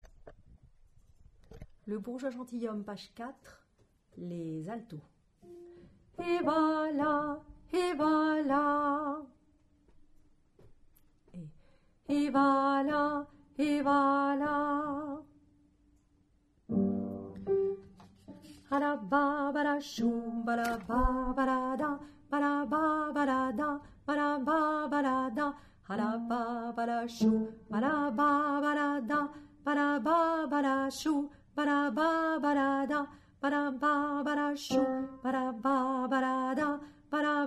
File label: Alto; Le bourgeois gentilhomme; Molière